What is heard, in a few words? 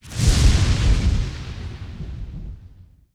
static field; zuus